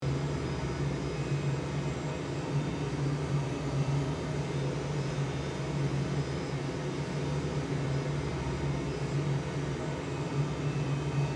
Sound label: Military jet airplane in flight interior perspective loopable; aircraft